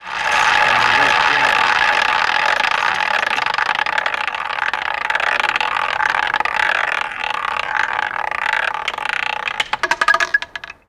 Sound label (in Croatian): RULET